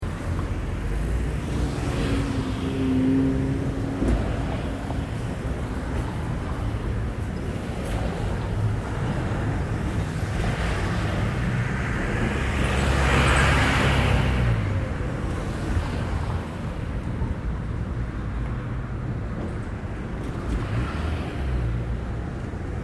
Traffic At Intersection Loop, Medium busy, mid day traff; Medium busy, mid day traffic at city intersection. Various vehicles pass by at mediu; Traffic And Car Sound Effects